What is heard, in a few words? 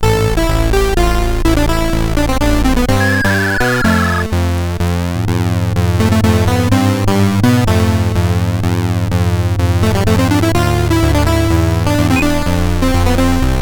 Rock, sid CSG8580R5